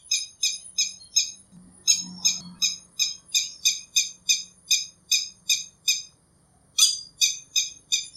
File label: Red-wattled Lapwing Vanellus indicus calls, Tambun Ipoh Perak Malaysia